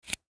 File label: Power Cord Plug In Electrical Outlet; Miscellaneous Electronic Sound Effects; Foley